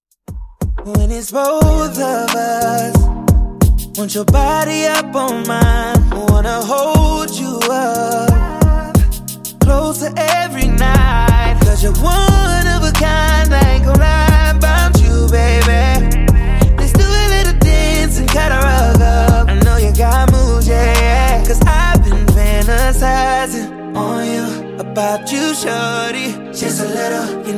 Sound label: Funk Intro Outro Dirty 90Bpm